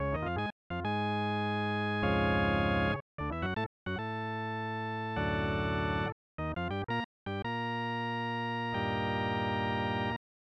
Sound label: Charge!; MLBPA Baseball